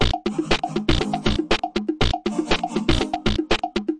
120 weird beat and vocal synth, synth and electronic loops